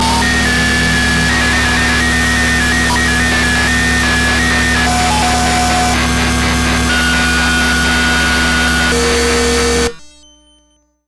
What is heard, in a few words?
pss-140 harsh modem